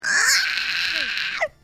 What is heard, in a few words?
screech